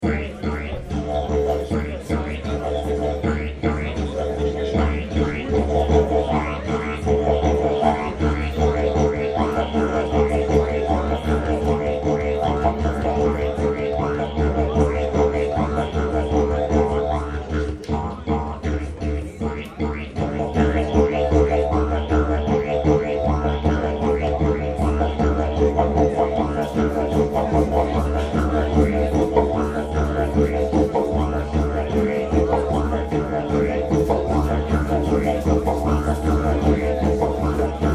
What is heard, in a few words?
Jump n run